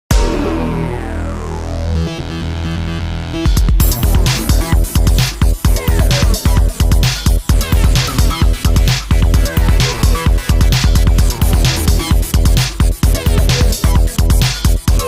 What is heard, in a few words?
race; Ferrari GT Evolution